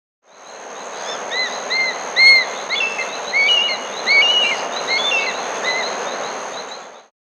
Solitary Eagle (Harpyhaliaetus Solitarius), Andean Birds; S. America; Natures Songs